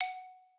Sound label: xylo